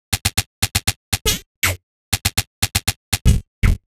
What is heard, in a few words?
Odd Glitch, 120 BPM, Percussion